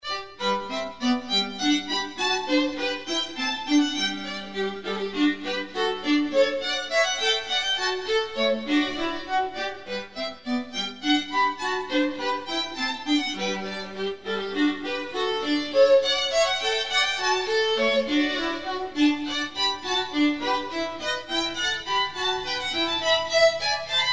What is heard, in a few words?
handel bourree